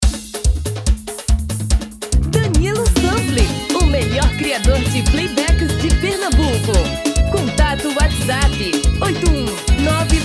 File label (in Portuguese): Axe tom feminino